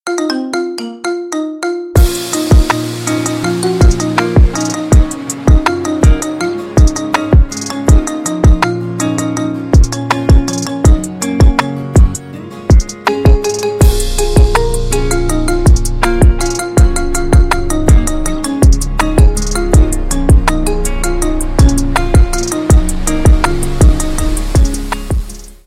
marimba remix

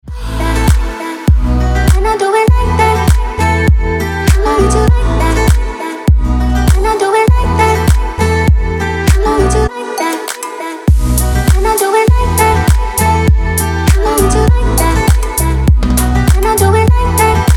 nezhnaia gitara; романтические

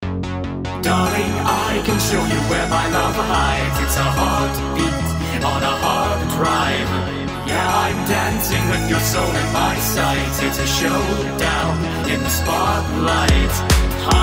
speed up

